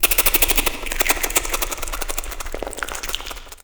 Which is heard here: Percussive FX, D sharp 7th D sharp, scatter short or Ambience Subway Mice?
Ambience Subway Mice